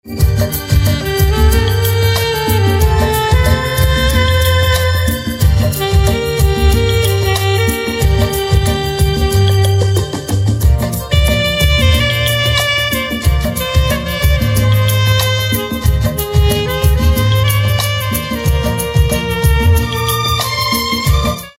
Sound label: Saxophone Ringtone